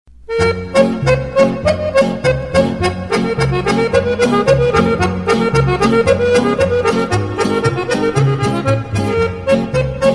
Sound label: Uzicko Kolo